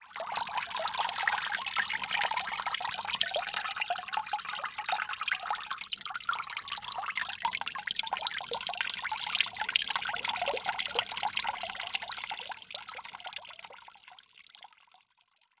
Liquid Water Dripping Into Water In Plastic Bucket Long Fast